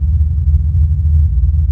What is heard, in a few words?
tunnels; Star Wars Jedi Knight II Jedi Outcast; XBOX; ambience; yavin